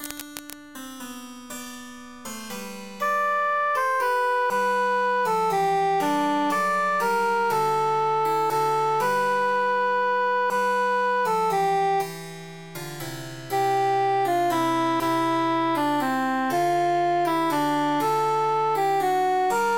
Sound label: altus emphasized; follow me sweet love